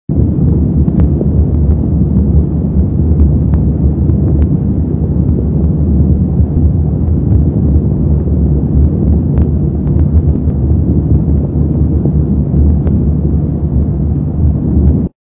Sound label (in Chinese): 肺泡呼吸音减弱或消失; XinFeiTingZhen